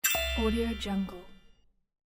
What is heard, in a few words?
buy sound